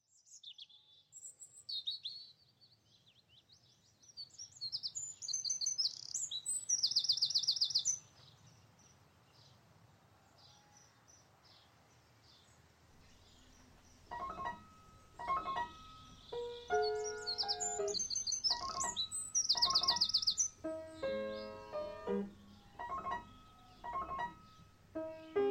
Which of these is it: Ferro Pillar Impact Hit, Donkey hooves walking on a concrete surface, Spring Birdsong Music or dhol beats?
Spring Birdsong Music